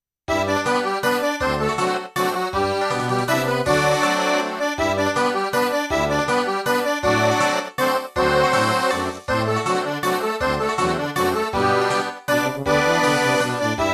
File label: luxembourger waltz midi